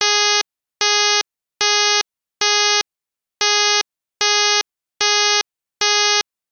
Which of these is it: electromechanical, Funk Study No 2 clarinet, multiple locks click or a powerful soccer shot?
electromechanical